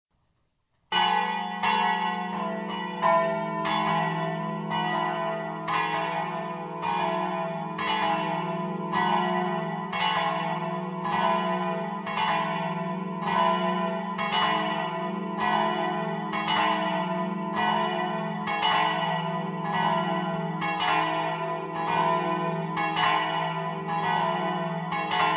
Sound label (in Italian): campane; cras